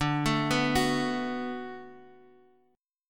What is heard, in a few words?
D 6th D down, chord